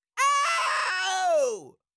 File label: Cartoon little monster voice shout scream long